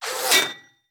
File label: Bullet Impact; Sound Ideas; Guns